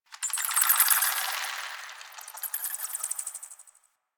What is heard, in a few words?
Night Bats; Warframe; Ambience; Eidolon Plains; One Offs